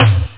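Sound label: electric snare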